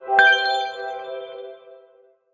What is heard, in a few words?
Splash; OnePlus